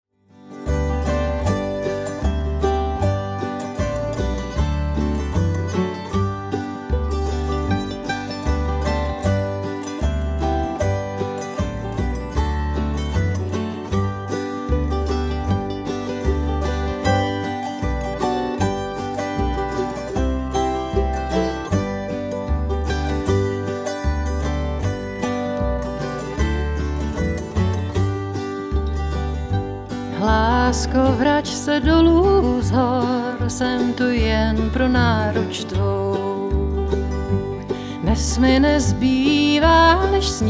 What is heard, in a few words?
autoharp